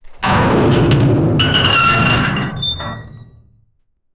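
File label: a giant metal door closing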